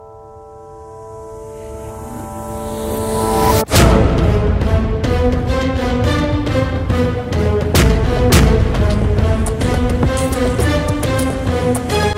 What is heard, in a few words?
intro music; ff2; neo rtx 2025